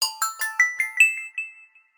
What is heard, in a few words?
Silvery, notifications